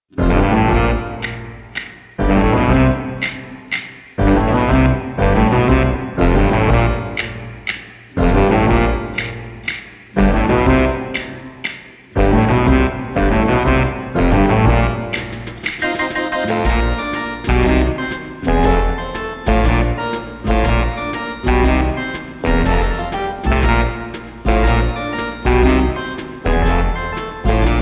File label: backing track